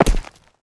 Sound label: footsteps land dirt; player